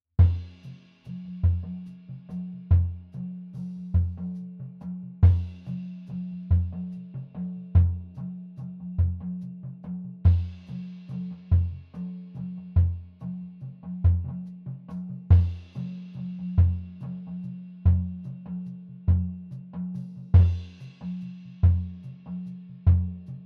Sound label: strange home kick, drums; film